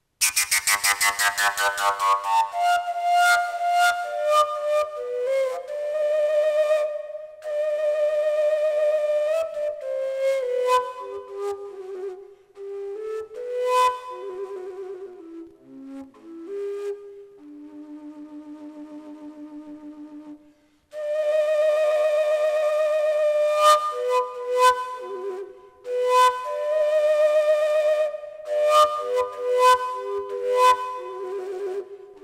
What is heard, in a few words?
g fujara concert elder; overtone fujara